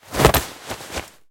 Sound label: leglock; battle